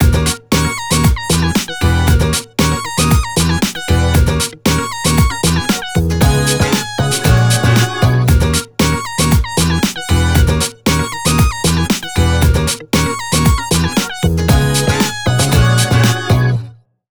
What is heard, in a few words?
Toggle; PlusFourSix; Rika (W705); Lars Edvall